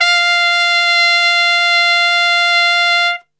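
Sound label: F 3 piccolo trumpet, Brass; Best Service; Brass Super Section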